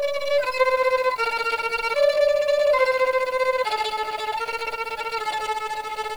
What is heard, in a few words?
tremolo